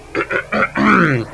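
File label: clear throat; People; Sound Library 2000; Wayzata Technology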